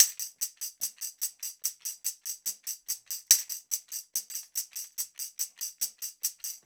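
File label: TAMB, tambourine; Drumdrops In Dub VOL-1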